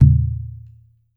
NATIVE, ETHNIC DRUMS; Spectrasonics; Liquid Grooves 1 & 2

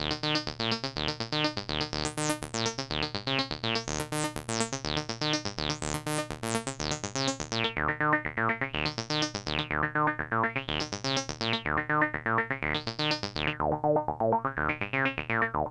RAVE 123, SYNTH SEQ; AMG; Global Trance Mission VOL-2

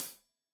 Hat Tight, Hi-Hat, Cymbals; Deeply Sampled Drums

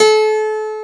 PIANO G2; J.ROCK

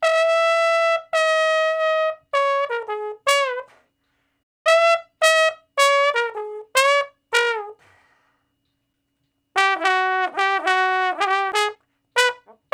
Trombone Shuffle (Db); Crimson Blue and Fabulous Horncraft 4 RnB; Horncraft for R&B; Solos

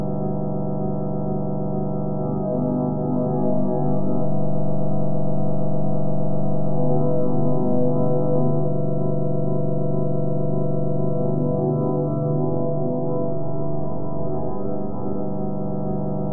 OSC MD; Bolder Sounds - Granular; SIBYLLINE

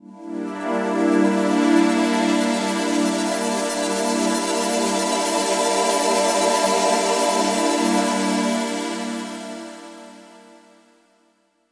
Reso Pad; Dr. Fink's Funk Factory

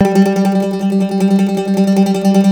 MANDOLIN

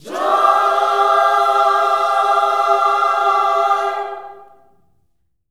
JOY C MAJ, Gospel, Vocal; ILIO; Vocal Planet

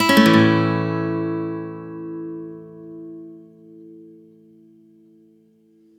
One Shot A upstroke, Acoustic Guitar; Sonic Foundry (Sony Creative Software); Songwriter Acoustic Guitar Companion

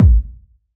BD, BASS DRUMS; EdgeSounds

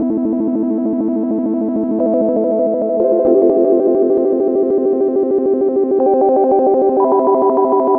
Flittery, Synths, Modular Electronica; Sonic Foundry (Sony Creative Software)

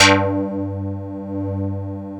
WAVE CLAV G2, MICROWVE; Northstar - New Gold VOL-1

